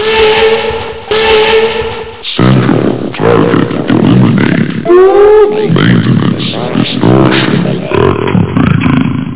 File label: chest fail